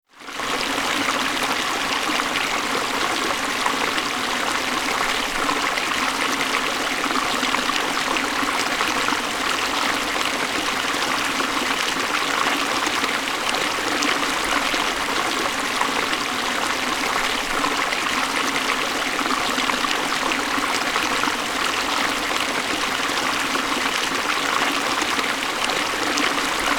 water splashing creek river

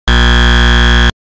Red Cross Buzzer Sound

